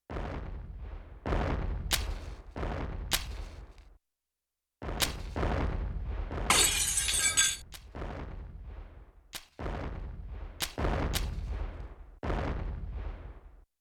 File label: C+E+G, Yamaha TX16W; Sound FX